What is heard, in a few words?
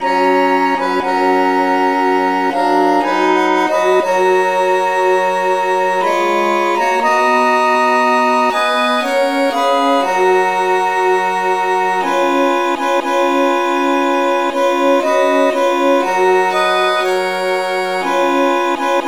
traditional keyb